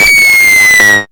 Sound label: NOIZ FALL, Hard & Loud Techno; USB Soundscan vol.01; AKAI